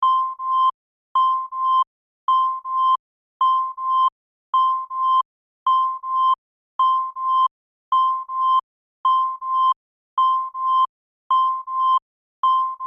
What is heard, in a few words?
Telemetry Blips, Scifi, Telemetry, Blips, Science, Fiction; Communications